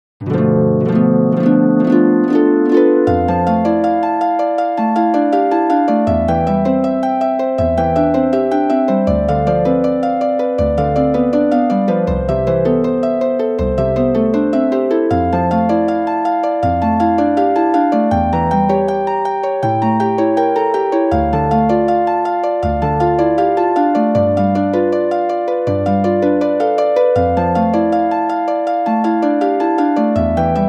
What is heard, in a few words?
Backing Track C major; Legend of Zelda OoT